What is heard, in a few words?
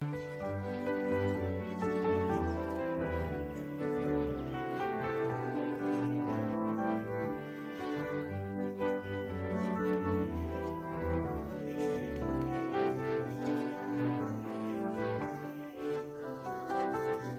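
backing track; luntik; pesnya dlya detei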